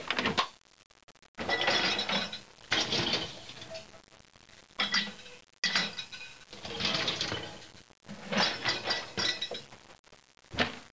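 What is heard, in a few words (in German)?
spülmaschine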